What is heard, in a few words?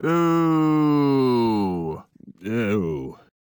Man Booing; voice; Zomboid; Lifestyle